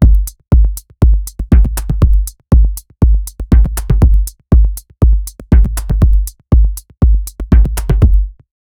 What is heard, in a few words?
minimal house drums rhyth